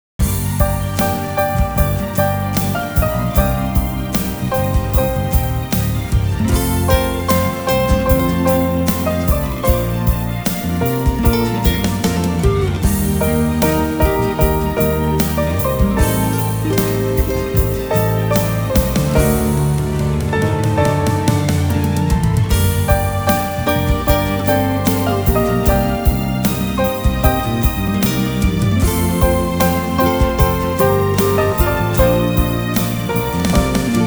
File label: Piano